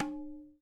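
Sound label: TABLA left; WorldSounds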